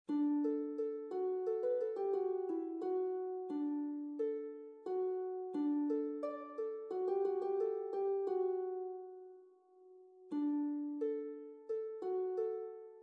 round, Folk Harp

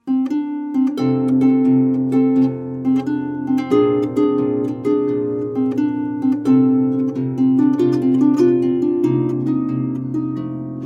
Dirty Dishes Blues, Folk Harp

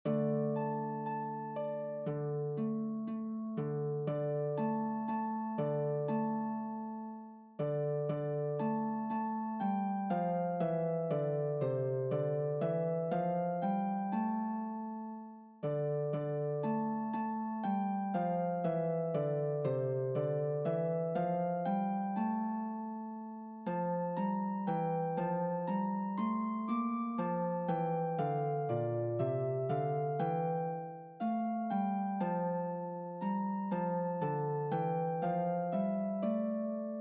God rest ye in D minor, harp

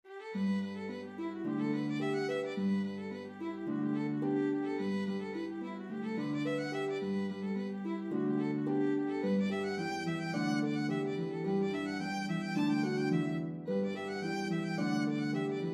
Violin Harp; Duet for Violin & Harp; This reel is charming as a Wedding Recessional or for a Festive Event.

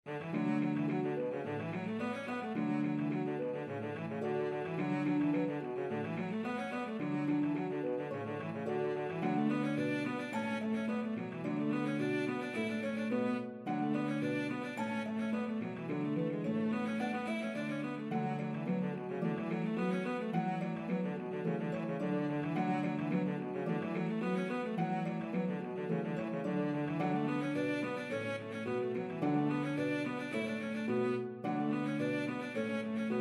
Cello Harp; Duet for Cello & Harp; This reel is charming as a Wedding Recessional or for a Festive Event.

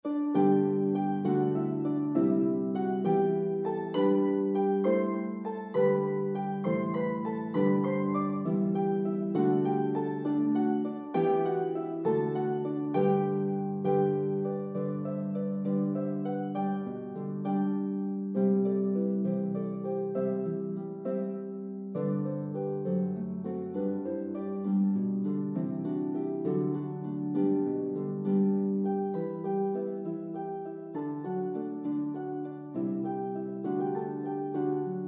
Harp Duet; John O Connor

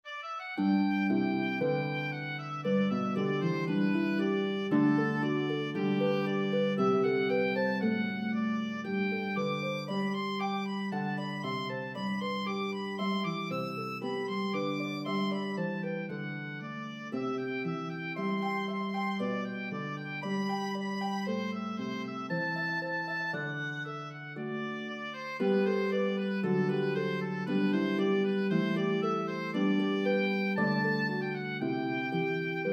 Oboe Harp, Duet for Oboe & Harp; Carolans Concerto

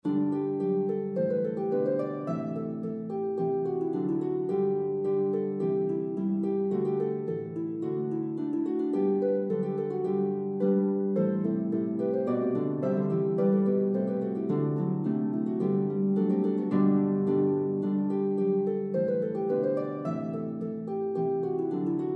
Harp Duet; Morgan Magan

